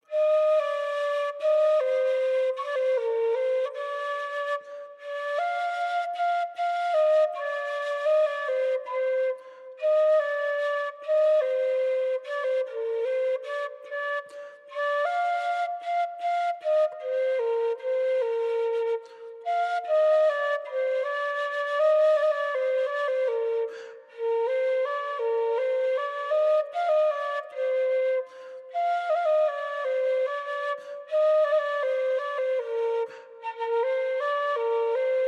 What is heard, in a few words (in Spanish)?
Flauta conducto de pico